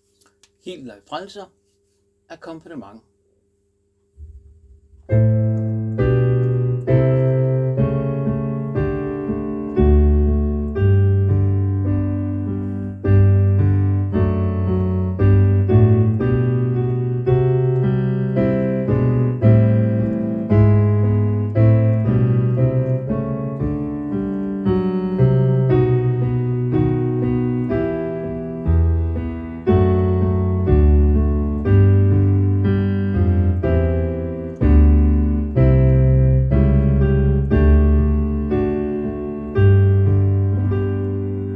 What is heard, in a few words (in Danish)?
hill dig akkompagnement